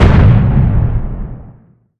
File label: cannon shell; Weapons; ekgunnery; EK modern; Barotrauma